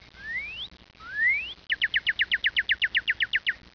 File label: Cardinal